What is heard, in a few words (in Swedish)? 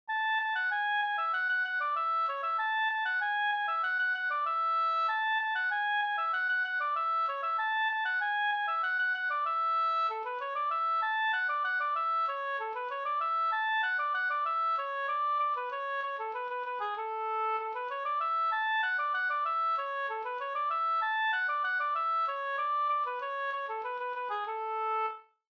halling